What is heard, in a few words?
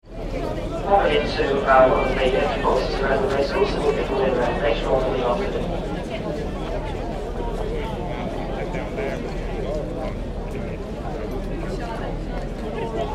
Horse Race Meeting Crowd, Big meeting with tannoy - exterior; Sports